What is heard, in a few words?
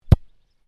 Soccer Ball Bounce On Grass; Football; Sports; Soccer (Sound Effects:Sports); Stuart Duffield